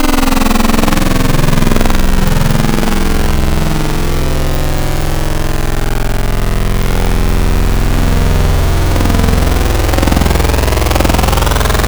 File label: Saw, wavetables; Saw Frick; Cymatics; Wavetables Collection Vol 3; Serum